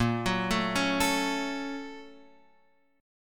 A sharp Minor Major 7th Sharp 5th

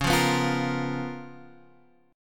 C sharp 13th C sharp, Guitar, Half Step Down; C#13

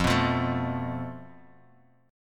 F Major Flat 5th; strummed; x,x,3,4,5,4,x,x; JGuitar

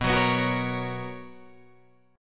B Major 7th Suspended 2nd B Guitar Drop D

